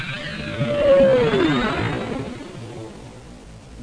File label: warp long; ProOne: Netcracker Netscape Navigator